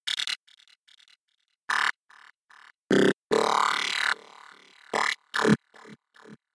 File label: Energyball demonstration laser cutoff